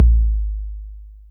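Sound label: bd, 808 bass drum; Best Service Gigapack 2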